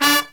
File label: HIGH HIT, Brass Riffs; USB Soundscan vol.29 - Killer Brass Riffs; AKAI; HIGHHITS